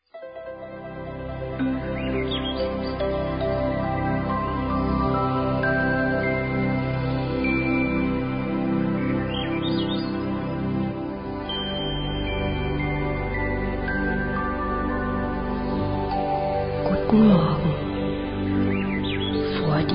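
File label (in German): Relax Now Kinder, Meditationsmusik, Entspannungsmusik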